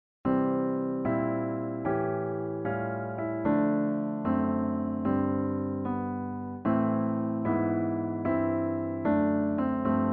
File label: Kawai SK-EX Classical Recording STEREO; Shigeru Kawai SK-EX